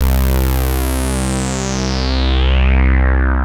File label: VCF decay C3; SE-1 BASSES